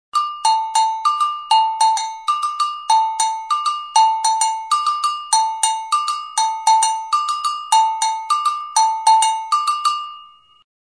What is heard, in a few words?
agogo